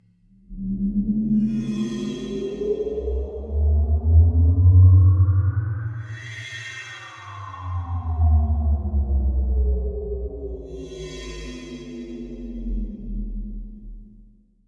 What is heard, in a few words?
space frequency; kz realmshift